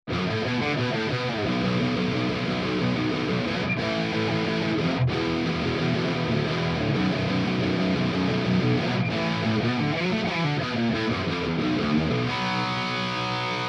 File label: MESA TX